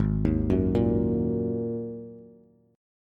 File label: Ab 7th Suspended 2nd down Bass; Ab7sus2; arpeggiated; chordshape; JGuitar